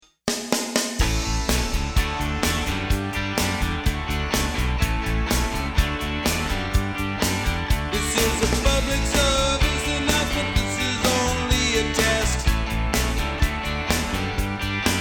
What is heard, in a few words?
warning